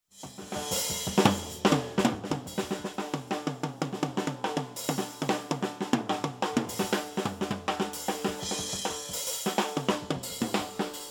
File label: Drumset